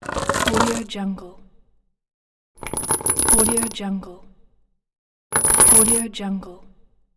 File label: chain falling